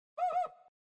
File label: notification low